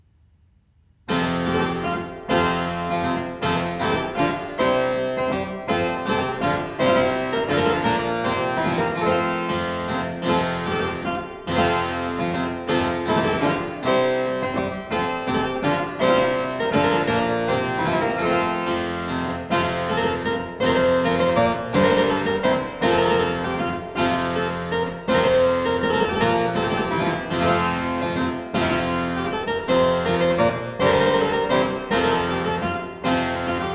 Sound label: La Volta (harpsichord); Byrd